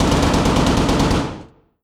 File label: light airplane fire; Hearts of Iron IV Death or Dishonor